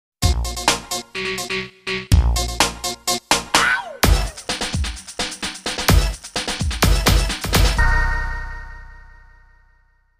techno hip; SPD 20

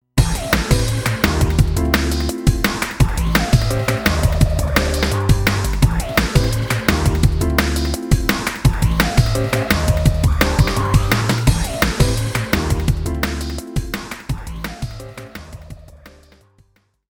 808 pattern 130

